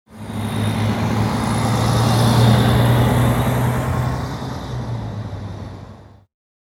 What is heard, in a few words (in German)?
lieferwagen faehrt vorbei